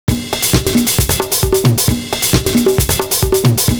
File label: perc perc perc